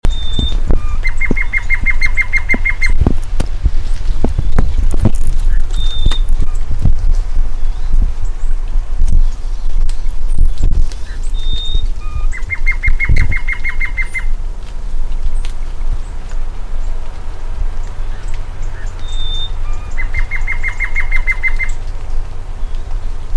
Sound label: Peruvian wren; پرنده الیکایی پرو